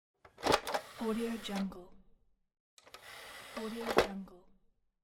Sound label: cd player